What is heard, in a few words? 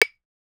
click